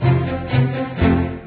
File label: gained quest; EDGE